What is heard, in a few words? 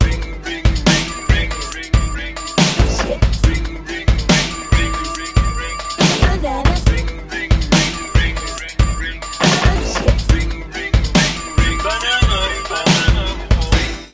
ringtone